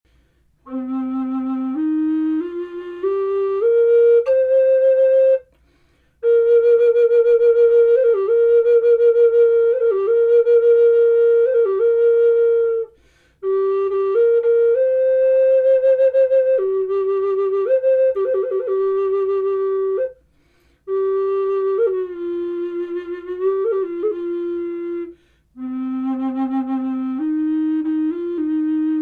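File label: natural, wood flute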